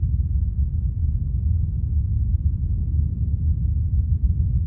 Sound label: rumble; Star Wars Knights Of The Old Republic I; XBOX